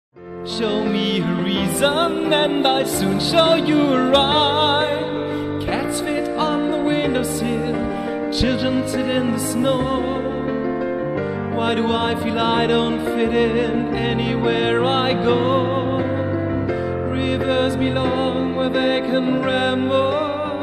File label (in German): Songs